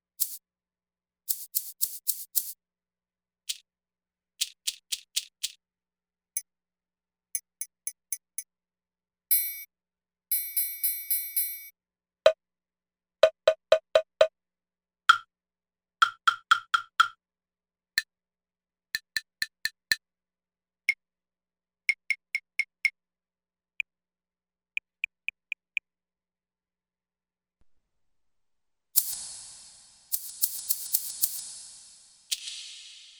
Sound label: Concert Hall